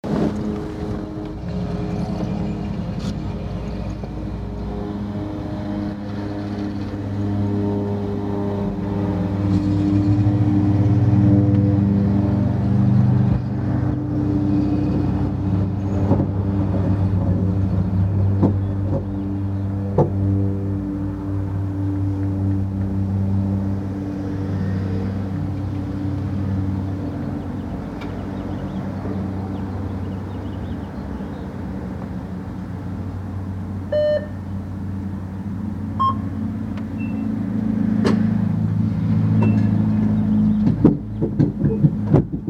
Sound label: Gas Station Richland WA